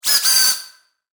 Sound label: High Tech Robot Movement; science fiction sound effect; Robot Sound Effects; star wars / trek style games; Andrew Fleming